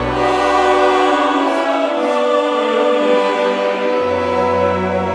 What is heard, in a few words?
Chorus